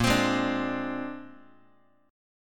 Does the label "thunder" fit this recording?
No